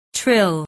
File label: trill